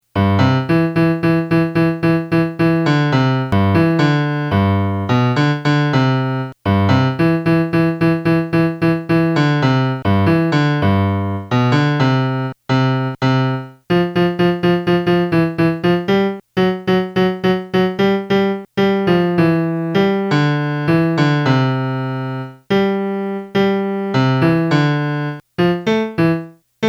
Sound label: melody